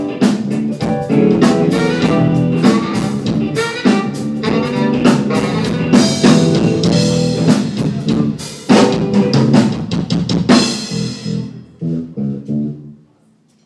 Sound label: soundcheck